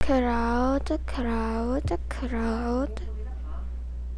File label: crowd